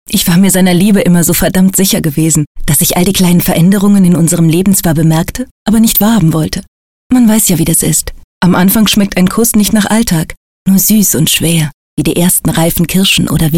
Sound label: jingle